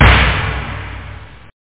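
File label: snare drum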